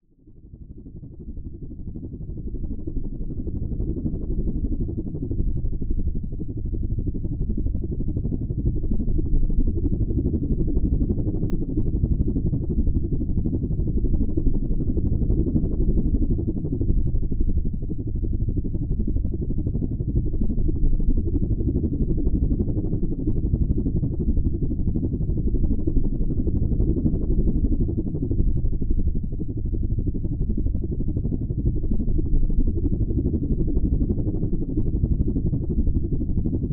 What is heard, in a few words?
helicopter long; vehicles; SPT Battle Ambience